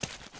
puddle; The Sum of All Fears

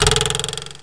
twangy

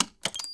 disarm bomb; The Sum of All Fears

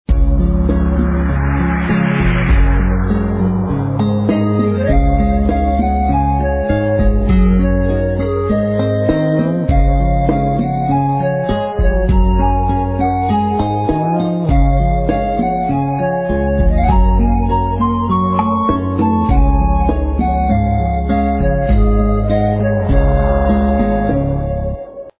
music bg; magic heaven

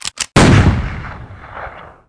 PISTOL; outlaws

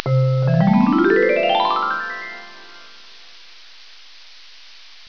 Gliss up; T.J. Finds a Friend

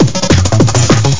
trance; The Epic Collection 3; Epic Marketing; muzak samples

